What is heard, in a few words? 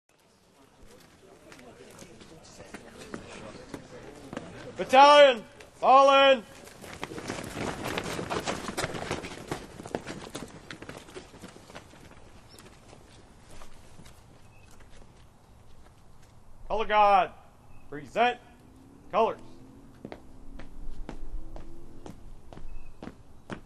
men's chorus; flag raising ceremony